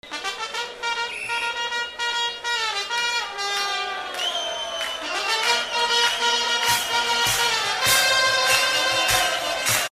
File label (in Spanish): gol trompeta